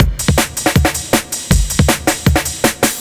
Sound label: drums, Hardcore Breakbeat; Big Fish Audio; Hardcore Breakbeat Action